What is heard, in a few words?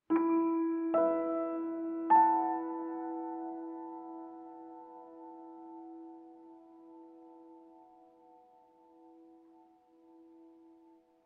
tune